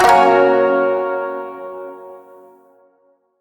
Shawzin Yo Chord F; Chords; Warframe

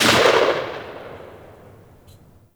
Uzi Tail; Warframe; Tenno

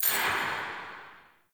Vendor Buff Menu Hover; Tau; Circles Of Hell

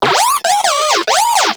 Moa Compassionate Vocal Enemy Killed; Warframe

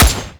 Gunblade Automatic Shot; Tenno

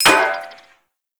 Flame Spear Mech; Warframe; Grineer

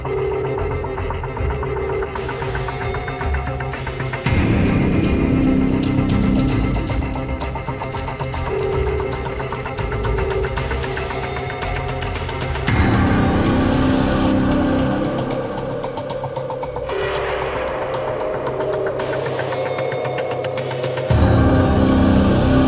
Music; Warframe; Cinematics; Second Dream; Operator Cradle Front

